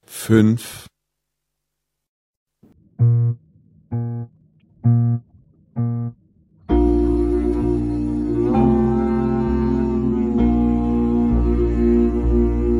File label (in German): Klang, Hornschule